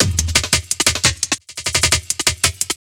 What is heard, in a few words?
BREAK 174